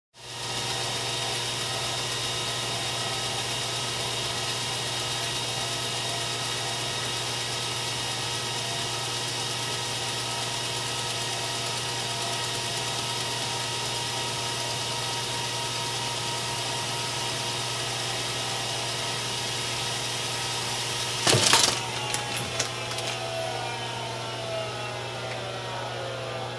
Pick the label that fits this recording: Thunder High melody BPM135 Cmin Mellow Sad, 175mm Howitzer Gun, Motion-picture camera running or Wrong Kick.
Motion-picture camera running